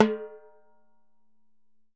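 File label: TIMBALE, Salsa Kit; E-MU Producer Series Vol. 7 – Old World Instruments; Drums&Percussion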